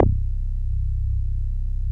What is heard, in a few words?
CRACK C1, BASSES